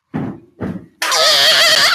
eyeyeyeyyeyeyeyey; momo

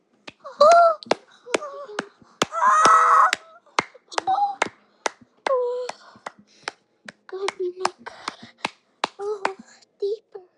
clapper

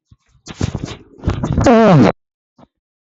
real fart noise from but

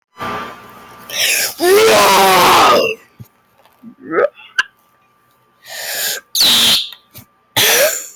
rahhhhhhhhhhhhhhhhhhhhhhhhhhh

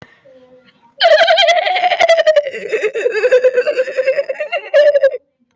hehehehahaheha

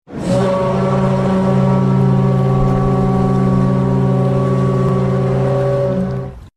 horn; titanic

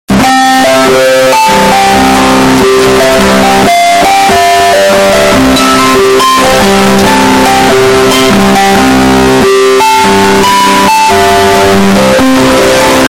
iphone ringtone

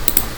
mouse click

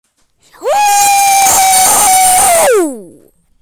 whistle sound; old spice

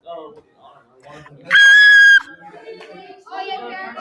screaminginga

